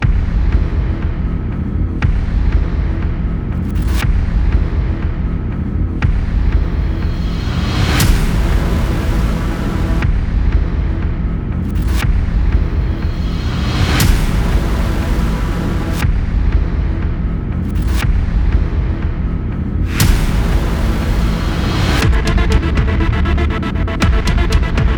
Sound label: synth only